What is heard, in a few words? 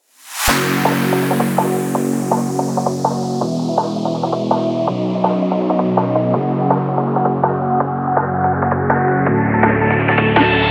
dnb